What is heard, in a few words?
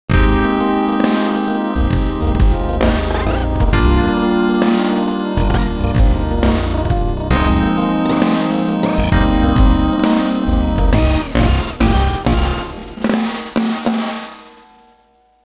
Pop; WSOLA; TSMtoolbox